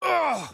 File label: AHurt